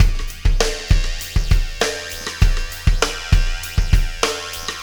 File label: Acoustic Drumloop